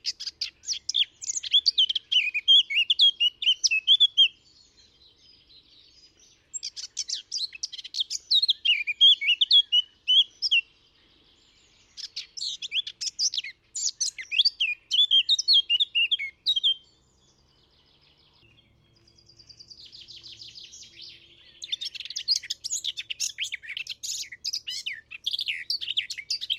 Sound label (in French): fauvette a tete noire; chant